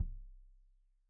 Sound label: Kik Stereo; Deeply Sampled Drums; SMDrum